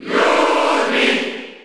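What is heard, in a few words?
Cheer Spanish, crowd; SSB4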